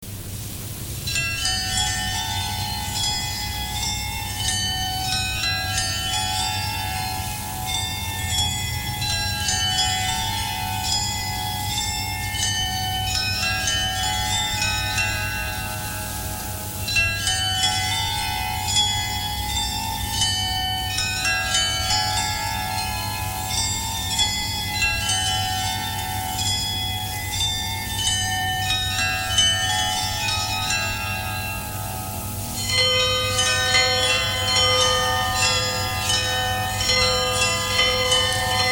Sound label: bell; salzburlg